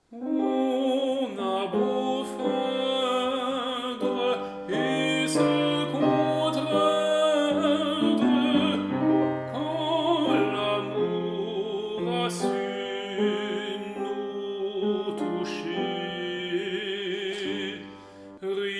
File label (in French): Soprane ornements; Lambert; On a beau feindre